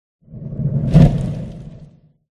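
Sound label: werewolf buff; oboroten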